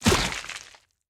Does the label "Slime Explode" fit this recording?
Yes